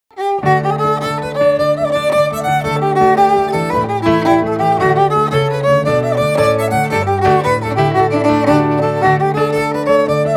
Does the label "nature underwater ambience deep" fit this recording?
No